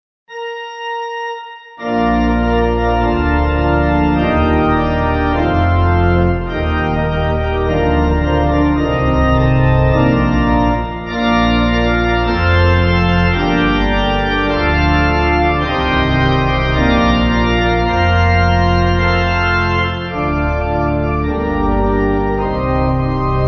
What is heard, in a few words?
Adoramus Te Pipe; Christ We Do All